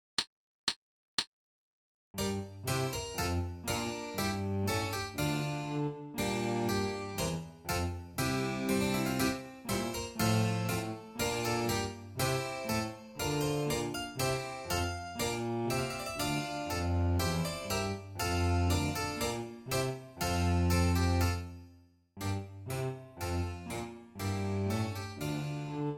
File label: Bourree Full Tempo Accomp Only; Handel